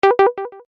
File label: UI Deny, User interface feedback sounds; Ui Set I; Computers; Electronics